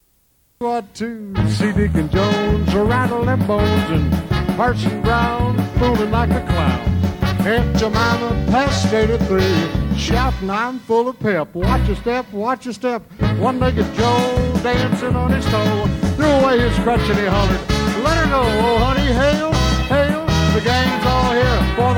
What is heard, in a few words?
Country